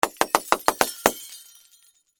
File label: Crash Light Bulbs, Crash, Light, Bulbs, Smash, In, Series; Glass (Sound Effects:Materials)